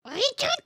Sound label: Angry, emotes